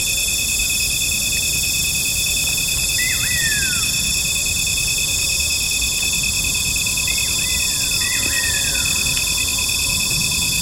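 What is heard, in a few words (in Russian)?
атмосфера ночи в джунглях Таиланда с пением птиц и насекомых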